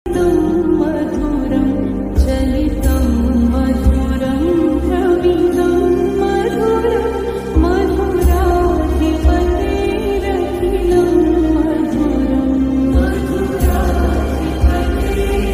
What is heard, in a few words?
adharam madhuram lofi mix